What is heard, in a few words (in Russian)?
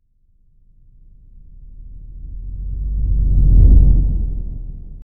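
пролет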